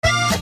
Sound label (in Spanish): acordeón